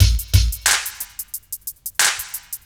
90 DRUMS

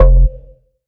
Bass F, Bass Synth

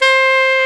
SAX A. MP C, Alto Short; Roland LCDP07 Super Sax

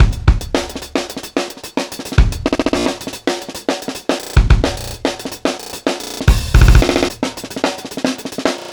Bon Bon 4-Bar, Breakbeats, Drum n Bass; KJ Sawka; Drum n Bass & Beyond

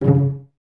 CES C#3 Pizz, Cellos, String Ensembles; Miroslav Vitous

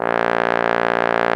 A#1, BRASS

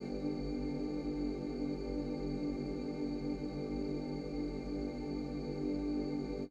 pad; M8

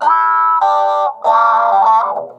WOW, Funk Guitar; 90 s Sample CDs; Zero G

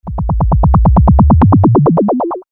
Liquid Lustre, synth fx; X-Static Goldmine

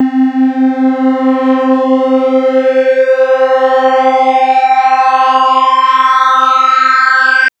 Synth Triangle Sweep Pad c3

